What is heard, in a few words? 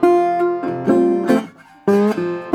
Blues Guitar Samples